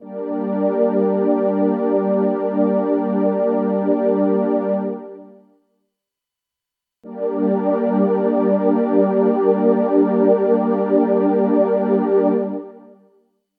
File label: fx chorus; casio xw-g1